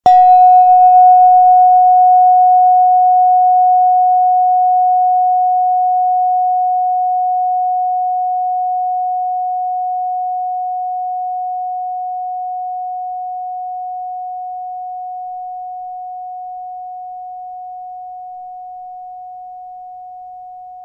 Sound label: cosmic tube; cosmic tube jupiter